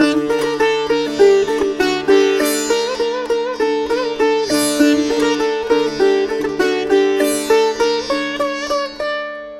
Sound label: Sitar D MIN 100; Indian sitar; Indian Sitar Vol. 2